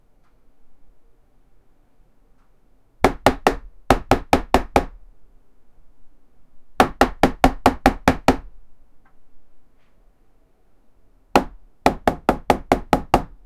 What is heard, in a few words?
punching on a wood door